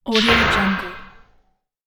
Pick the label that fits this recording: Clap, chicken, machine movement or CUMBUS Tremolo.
machine movement